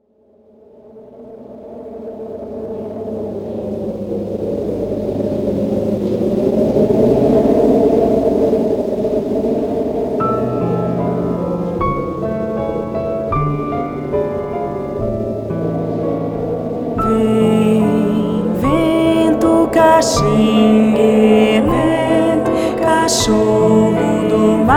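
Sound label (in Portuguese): (Vocal); Violino para os Pequeninos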